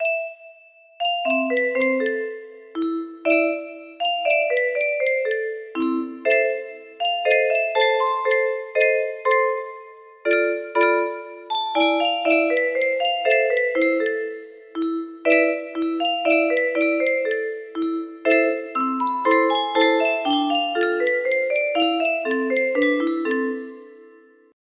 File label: waltz in b minor, music box; op 69 no 2